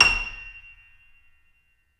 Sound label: C7 F, The Ultimate Piano Collection; East West